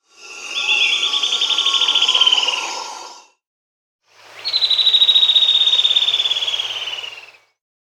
Lineated Woodcreeper (Lepidocolaptes albolineatus), Peru, Bolivia; Birds of the Amazon; S. America